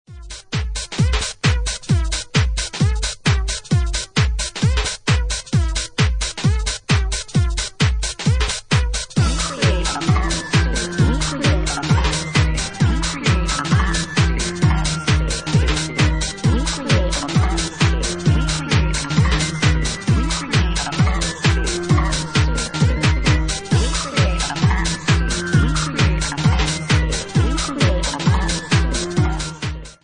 Atmosphere

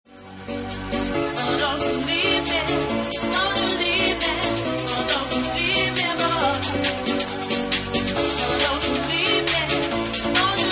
Bassline; Bassline Meltdown Session 1

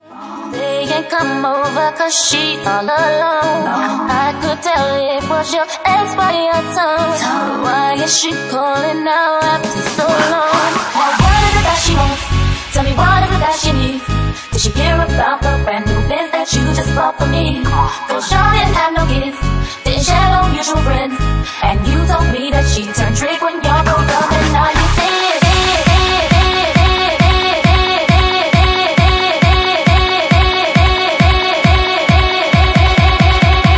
Organ Remix; Bassline House at 136 bpm

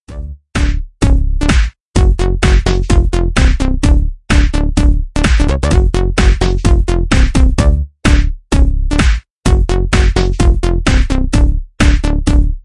Electro Mix

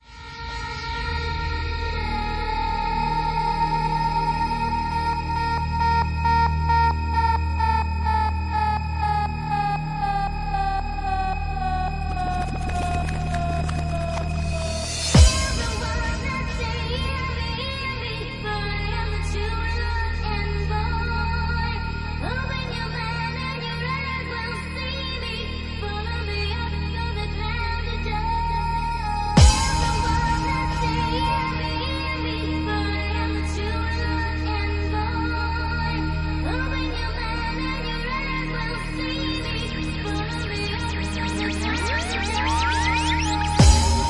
Bass Generator Mix

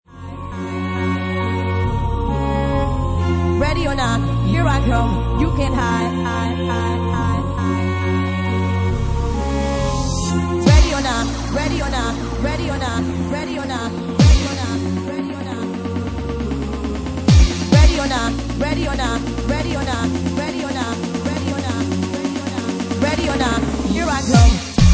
Organ Remix

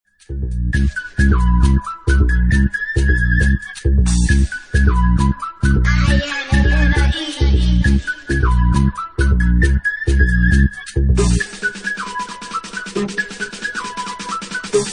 Disco

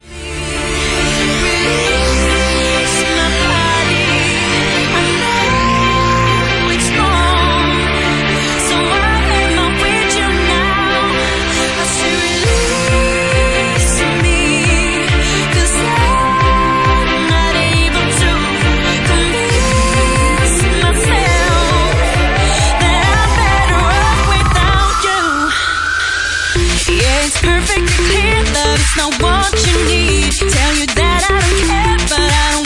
Organ Mix

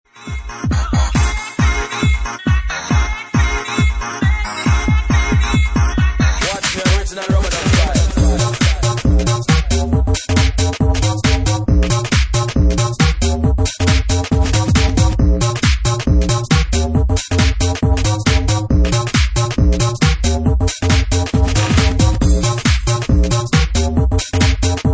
Bassline; Bassline Meltdown Session 1

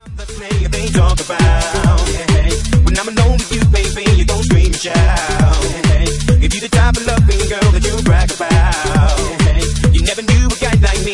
Organ Mix

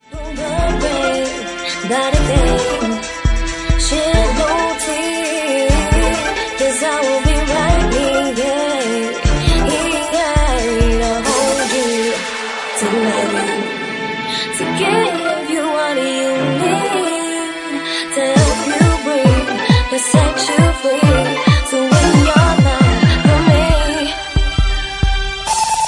Bassline Remix

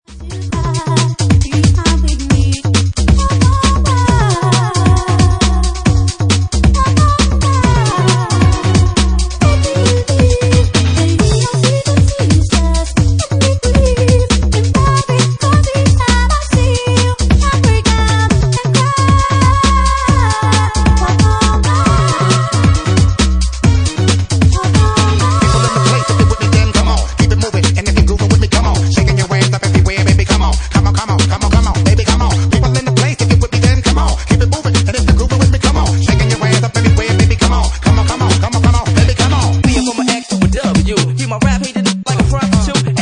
Garage

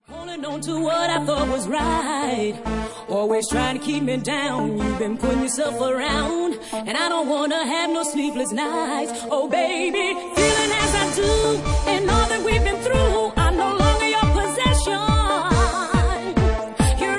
Organ

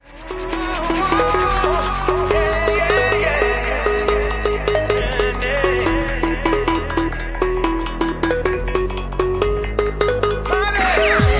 Nu Remix

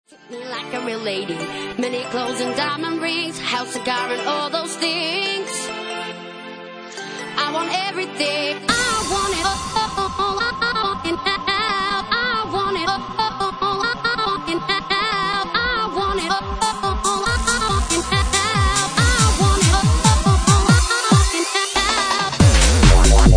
Bassline Mix

